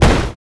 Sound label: shot